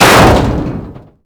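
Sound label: debris metal medium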